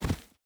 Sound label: footstep barefoot dirt jump land; Rust